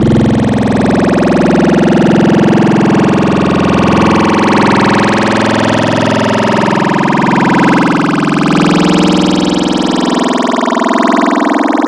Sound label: Throaty Growl, Serum Wavetables; Cymatics